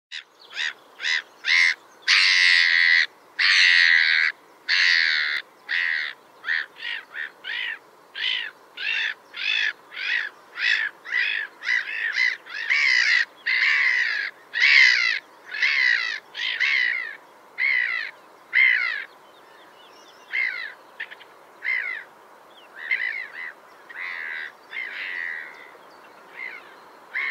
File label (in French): Chant de la mouette rieuse Song of the Laughing Gull